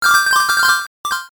coin shower

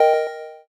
inner voice

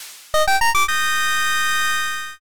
all clear

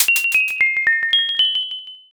cracker

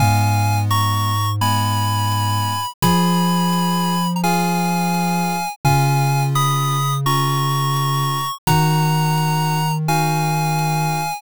bgm mellow

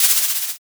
eraser

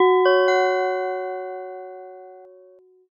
gamelan

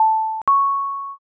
elevator

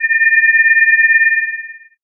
forcefield

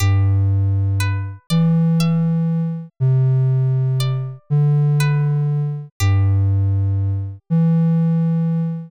bgm

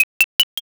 nail

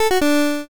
gacha lose

